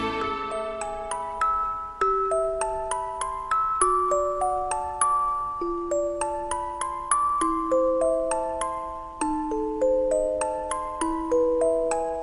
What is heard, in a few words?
Music Box